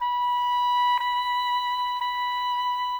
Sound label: Flute Oboe, Cinematic; Sonic Foundry (Sony Creative Software); Orchestral Series VOL-3; Cinematic 27